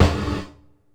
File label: Gated Snare; Gated Reverb; Univerb; musicradar